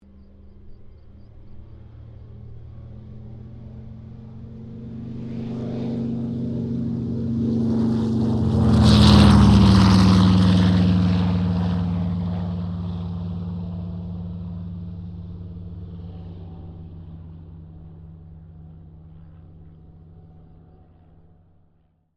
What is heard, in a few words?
AIRCRAFT CA-13 BOOMERANG TAKEOFF; Airplane Sound Effects; Airplanes (Sound Effects:Vehicles)